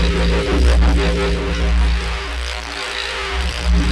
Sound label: FUZZ RIDOO; Distored Reality